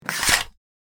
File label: Shower curtain slide rings on metal rail; blinds